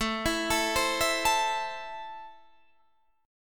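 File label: A Minor, Guitar Standard, chord strum; 17,19,19,17,17,17; JGuitar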